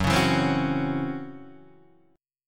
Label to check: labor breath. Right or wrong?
Wrong